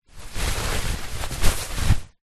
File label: foley clothes running shorts polyester movements handling